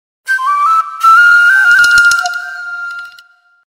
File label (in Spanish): Ringtone flauta